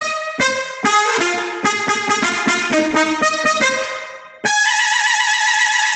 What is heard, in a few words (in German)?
trompeten